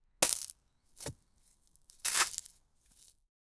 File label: sound of digging soil wit, digging